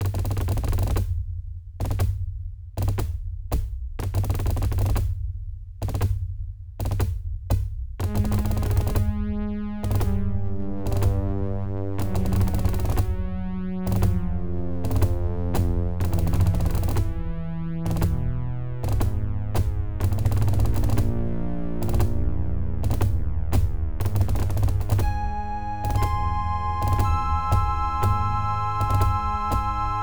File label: DIRGE; Spider CM - FM